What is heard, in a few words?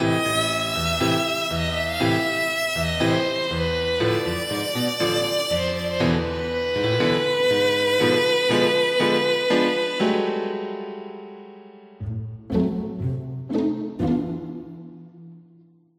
Lounge Intro; tintin reporter cigars of the pharaoh